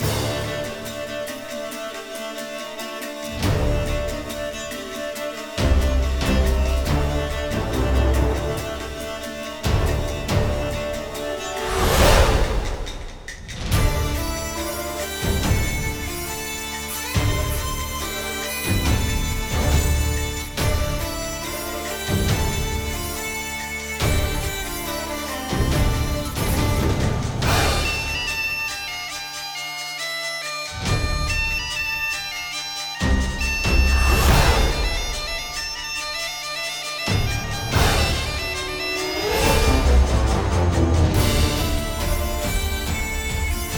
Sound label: Battle Theme; Cye; Lite; crush your enemies